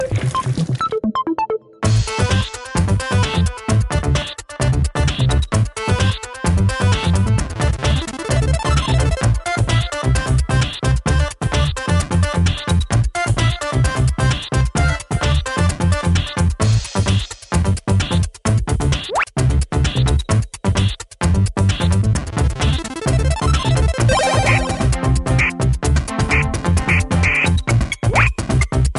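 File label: funny melody for SMS, excellent SMS melody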